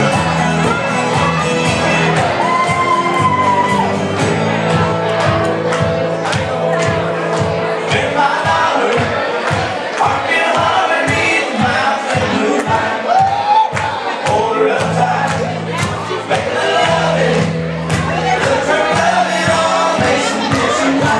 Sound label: ambience stagger inn orlando florida